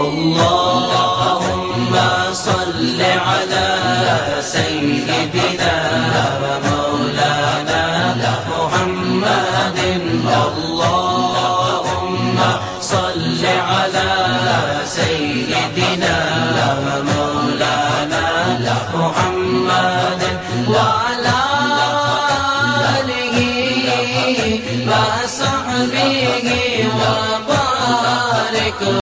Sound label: Eid Milad Un Nabi Ringtone islamic